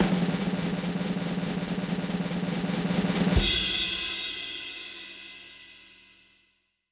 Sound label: winner announcement drum roll cymbal crash reverb